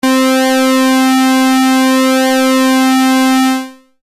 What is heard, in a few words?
Pwm waver, synthlead